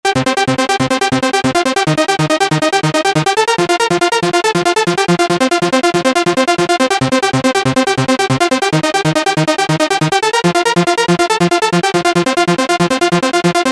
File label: Trance; abx3 trance presets vol1